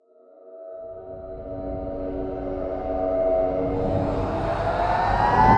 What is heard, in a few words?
controller psy aura r